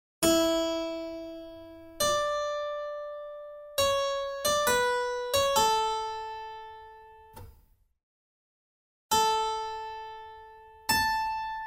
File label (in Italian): Tema e risposta